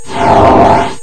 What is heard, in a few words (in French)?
Doodle Touche Ovni; DoodleJump